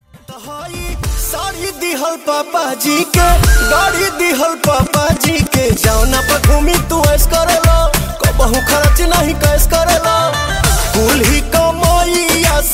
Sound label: Ringtone